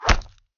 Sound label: Arrow Hit